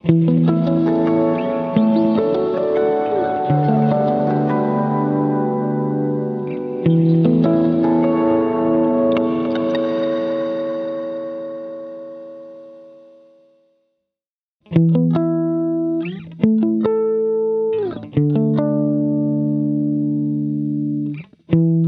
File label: Guitar; Dream Sequence; H90